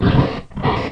grunt; Dota 2; snapfire; mortimer

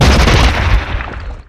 shockwave particle anvil; weapons; dota; magnataur

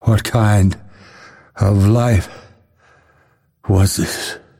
death; dota; phantom assassin

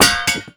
weapon drop common

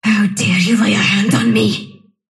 sylph death; dota; dark willow

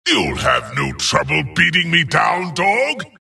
death long; dota; skeleton king

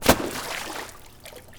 frog stomp splash; weapons; dota; largo

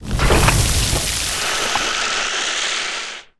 viper strike impact

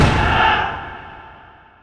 nightmare end; bane

